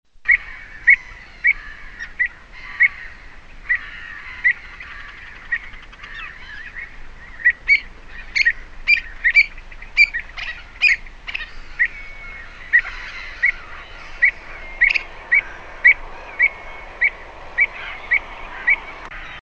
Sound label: Recurvirostra avosetta